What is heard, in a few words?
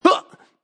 Vocal Funk Huh, Funk vocal loop sample.; 110bpm